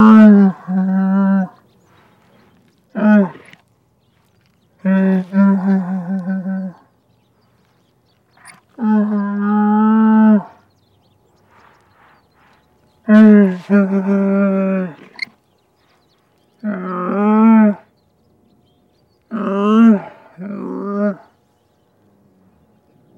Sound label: Camel Camel Moan Long; camels